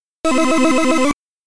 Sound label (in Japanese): レストタイムでモンスターが出たときの音; Pac & Pal; Pac-Man; arcade